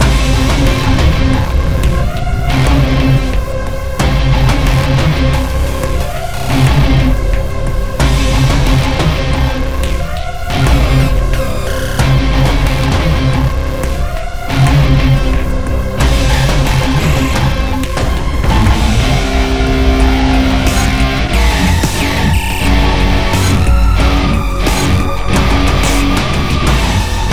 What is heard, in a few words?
Musik Desierto Fight